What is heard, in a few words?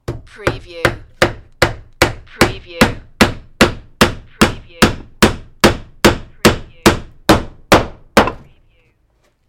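Hammer Nail Hits; 21 Hits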